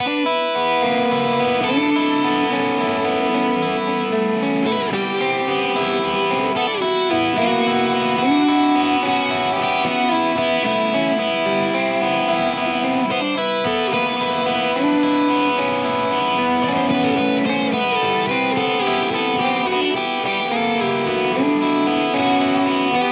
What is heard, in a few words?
FGN J Standard Mythic 7 both